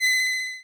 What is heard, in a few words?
Cry